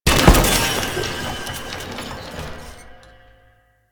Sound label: Target Dummy Impact; Warframe; Duviri; Waiting Room